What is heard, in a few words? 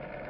rotary phone dialing